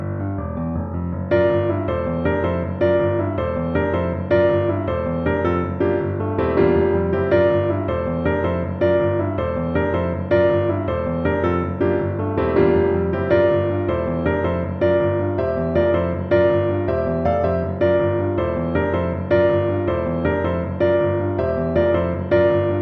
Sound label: Propulsion Exercise